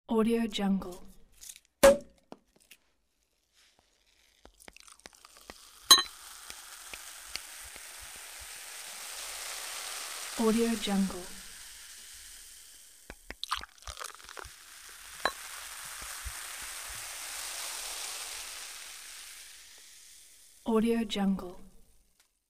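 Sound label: champagne cork and bubbles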